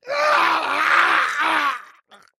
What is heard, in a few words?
male hate death